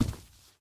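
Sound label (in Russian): частый звонкий выстрел салюта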